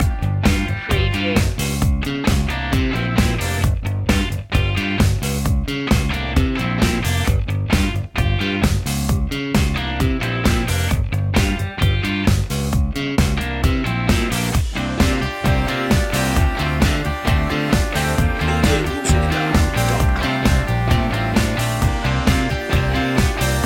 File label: dirty disco loop